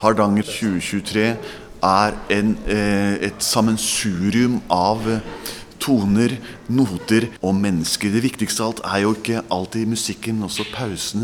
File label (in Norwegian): folkemusikk